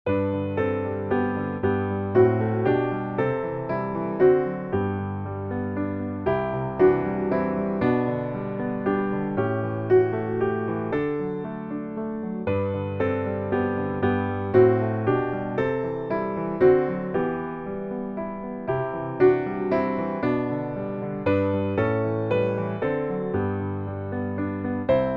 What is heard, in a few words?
piano